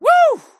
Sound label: woo